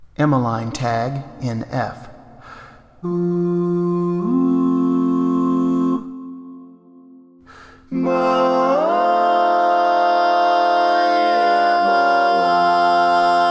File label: Lead, barbershop